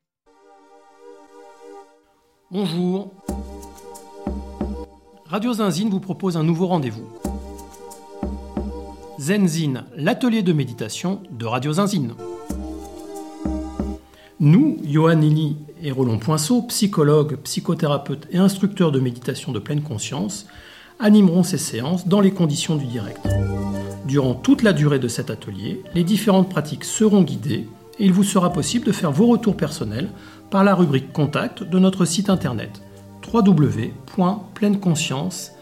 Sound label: Jingle; Court Zenzine